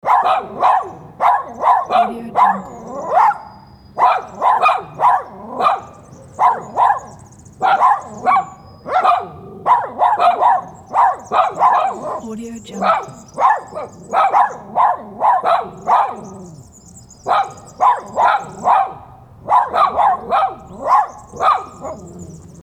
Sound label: barking dogs